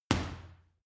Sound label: boxing punching bag uppercut hard